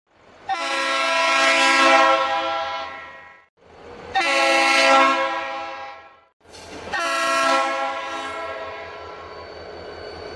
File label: Train Horn; FEC 412